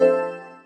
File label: key menu back, Keytone